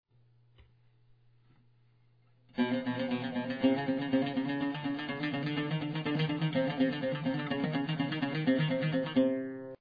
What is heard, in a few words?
left hand ex, oud